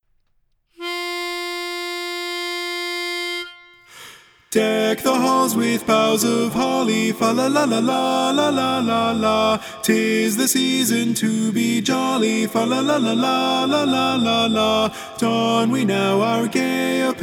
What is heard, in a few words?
Deck the Halls, bari mono